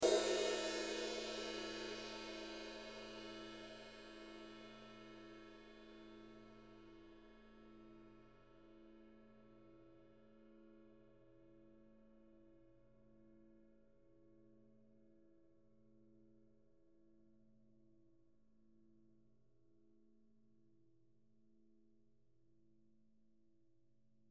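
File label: ride, ZXTi 20 MR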